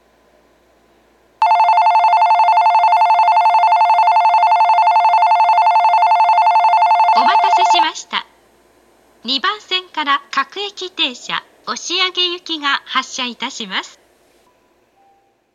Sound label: local, shibuya station; oshiage